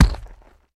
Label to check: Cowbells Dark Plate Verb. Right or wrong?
Wrong